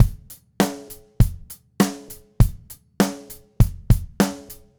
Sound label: Red Maple Groove 20-inch Kick 90; Sonic Foundry (Sony Creative Software); Vital Drums